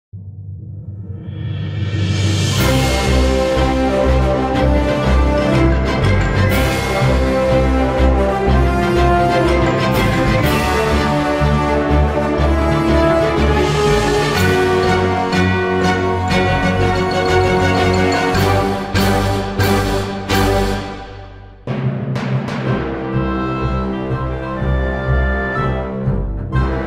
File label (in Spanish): musica de victoria